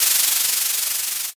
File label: drawing